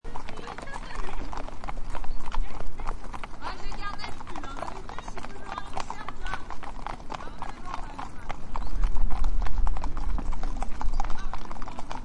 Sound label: horses kids